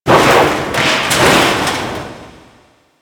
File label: Shipyards Metal Debris; Warframe; Grineer Shipyards